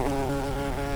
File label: buzz; scmod; humanerror; agrunt